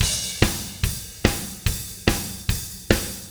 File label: 145 Offbeat Drums Chorus, Very Fast Tempo; Pop Rock; Pro Tools Essential Loops; Digidesign